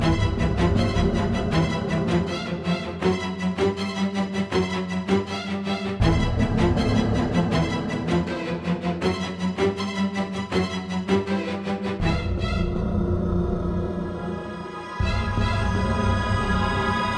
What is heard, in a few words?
The Kraken Battle Loop-Losing; burly men at sea ios macos ps vita ps4 switch windows gamerip 2016